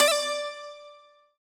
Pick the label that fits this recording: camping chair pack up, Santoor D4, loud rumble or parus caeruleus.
Santoor D4